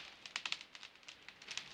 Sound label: Creak wood medium Loop